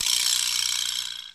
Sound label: vibraslap